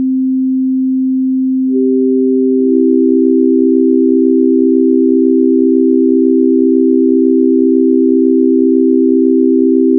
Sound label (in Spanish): do sol mi; Acorde de do mayor; Notas "do", "sol" y "mi" tocadas al mismo tiempo (acorde de do mayor) con ondas sinusoidales; Física General II; Las ondas están desfasadas ligeramente